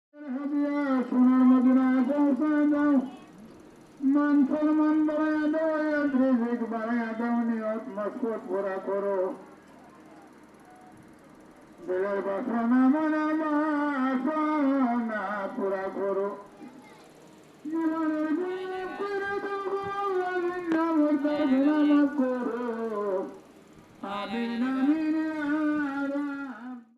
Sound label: Singing loudspeaker, Bangladesh; Les Cartes Postales Sonores; NOISE ON EARTH Vol2